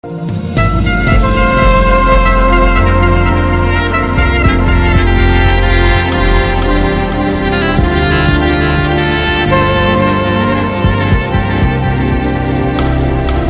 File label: Hollowing Sax